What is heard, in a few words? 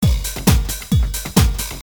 depressing rock music